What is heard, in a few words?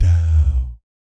DOW, Vocal Jazz FX; Spectrasonics; Vocal Planet; JAZZ COMBO